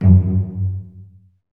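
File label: STRINGS SECTION 5; Roland - LA Composer vol. 1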